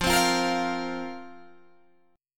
F Suspended 4th F, Guitar Open G

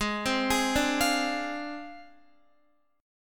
Ab 6th Flat 5th Ab, Guitar; Ab6b5 arpeggiated; JGuitar

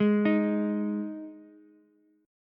Ab 5th Ab Ukulele; arpeggiated; JGuitar

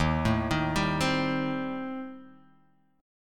Eb 6th Flat 5th, Guitar, Open D; 1,0,1,1,3,x

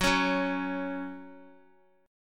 Gb 5th, Guitar; strummed; 4E,4A,5D,5G,5C,6F

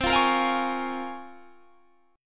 Bb Minor 7th C; Bbm7/C strummed

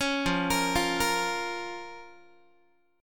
F Suspended 4th Sharp 5th F, Guitar; Fsus4#5; arpeggiated; 16,13,13,15,14,x

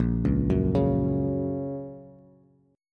Bb Minor Sharp 5th, Bass; arpeggiated; JGuitar

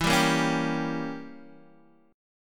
E Diminished 7th E down Guitar Open D

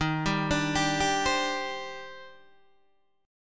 D sharp Major 13th, down, Guitar, Open D

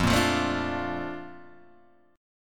A sharp Major 7th

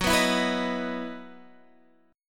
E Major 7th Suspended 2nd, Gb

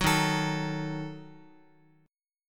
D sharp Diminished D sharp Guitar; strummed; D#dim; JGuitar

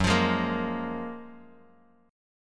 Gb Major 9th, down, Bass Standard; GbM9; strummed; JGuitar

